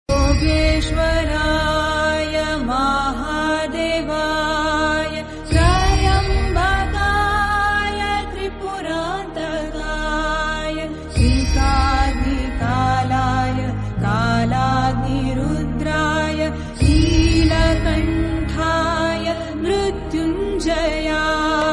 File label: instrumental ringtone, bhakti ringtone, shiv ringtone; mahadev